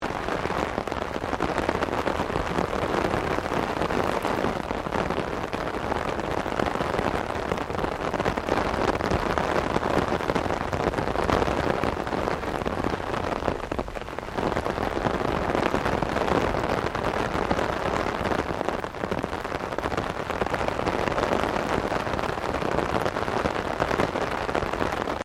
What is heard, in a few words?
RAIN ON UMBRELLA MEDIUM LOOP; Elemental weather effects